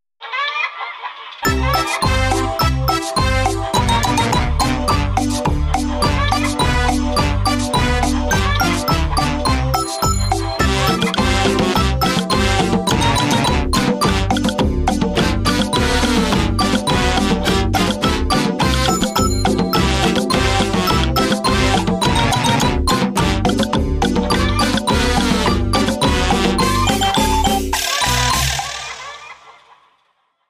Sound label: chicken coop follies